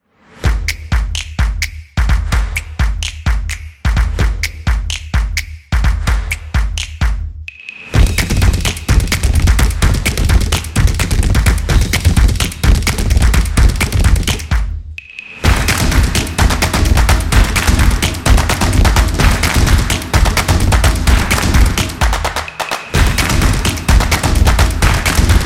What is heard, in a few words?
Beat Music